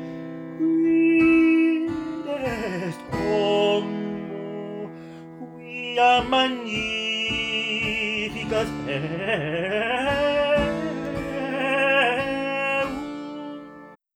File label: solo, Esclamazione, Coloratura or gorgia, trillo